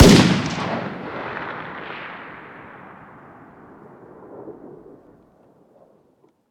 fire dist 308 rif ext; gmod; arccw uc commons attachments

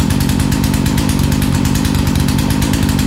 chainsaw idle loop; tfa starwars melee weapons pack

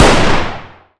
fire dist 300blk rif int; gmod; arccw uc commons attachments

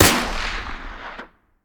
fire dist; gmod; arccw uc commons attachments

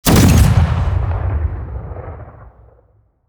fire dist, rocket; gmod; arccw uc commons attachments

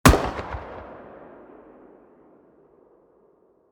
fire dist, 40mm; gmod; arccw uc commons attachments

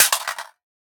rifle magdrop; gmod; arccw uc commons attachments

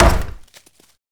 board damage light; hammer; melee; tfa starwars melee weapons pack

